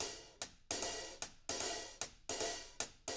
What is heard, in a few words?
HIHAT LOOPS, Living Drums